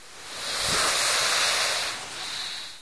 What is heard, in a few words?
idle, antlion; hl2